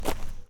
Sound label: Footsteps Dirt Single